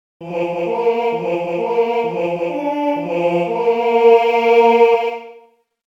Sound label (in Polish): Zakaz strzalu w miot, sygnaly mysliwskie; sygnaly mysliwskie ze slowami